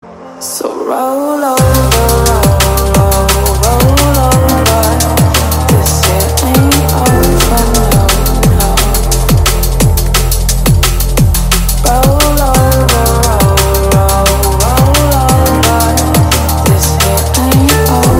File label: DNB ringtone